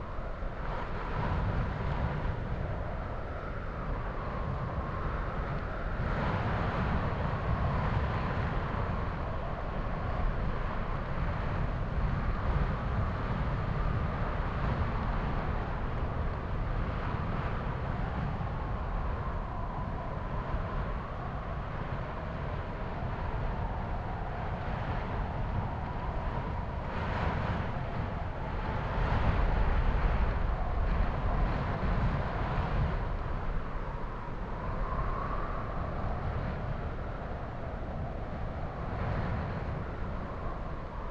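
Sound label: ambience dessert mountain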